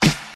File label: original acoustic snare d sharp key, Royality free acoustic snare sound tuned to the D# note; snare drum samples